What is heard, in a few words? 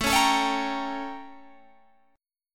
A 7th Flat 9th A, Guitar Open G; chord